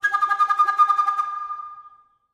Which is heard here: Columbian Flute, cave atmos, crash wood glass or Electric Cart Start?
Columbian Flute